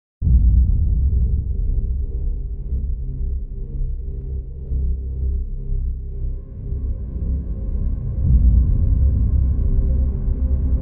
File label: Eradicator